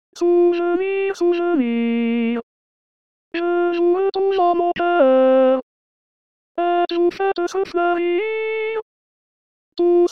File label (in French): 2eme voix femmes hommes, chorale